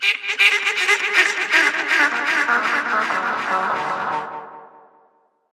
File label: Lose Jingle; Super Rub 'a' Dub; PS3